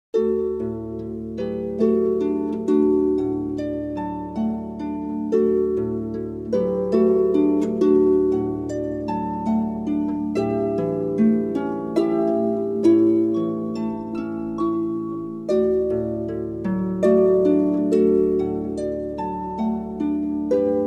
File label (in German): Konzert Harfe